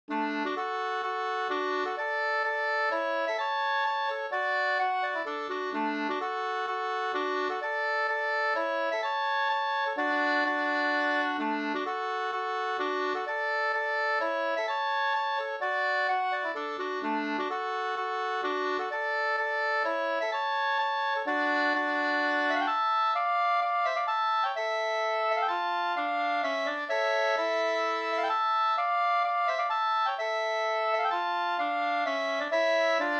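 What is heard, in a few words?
polska, two-part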